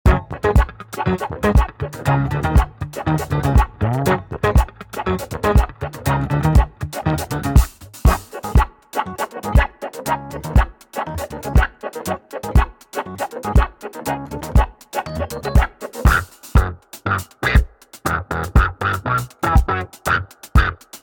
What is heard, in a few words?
Golden Age Funk; wavetick